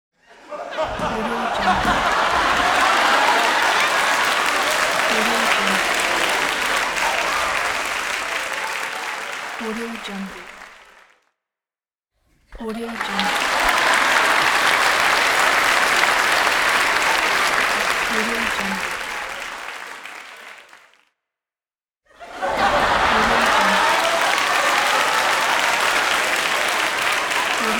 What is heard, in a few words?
audience applauding